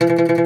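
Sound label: OUD tremolo, Plucked Strings; E-MU Producer Series Vol. 7 – Old World Instruments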